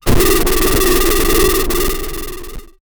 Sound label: dark wizard boss 2d 16bit